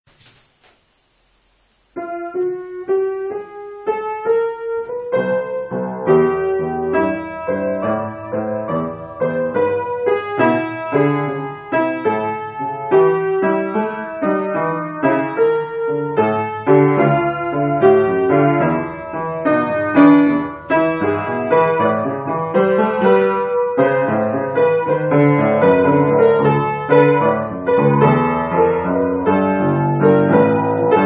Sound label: honky tonk slow